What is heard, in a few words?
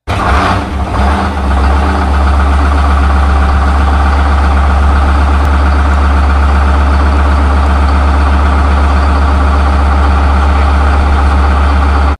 start idle; hl2dm